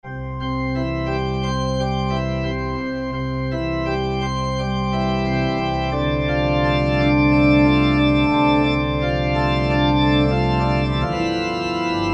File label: Pipe Organ, Korg MSC-9S Organ